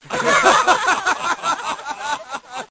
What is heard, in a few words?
Group Laughing